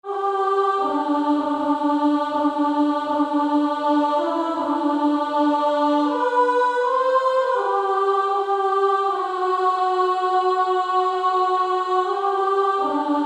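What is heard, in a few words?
Female Choir, alto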